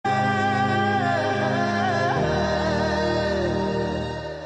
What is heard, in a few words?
Music; Qubool Hai